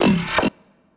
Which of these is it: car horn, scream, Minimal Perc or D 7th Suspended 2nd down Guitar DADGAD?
Minimal Perc